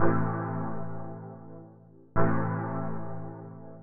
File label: DEEPHOUSE ORGAN 125 A